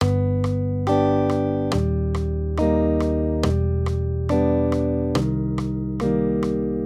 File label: maj triads